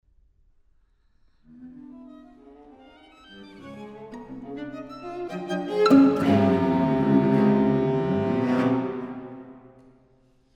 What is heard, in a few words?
microludes for string quartet